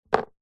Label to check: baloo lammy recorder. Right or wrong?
Wrong